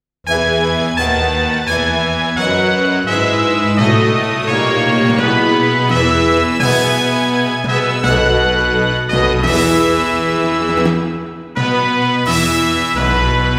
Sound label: brass band; ANTHEMS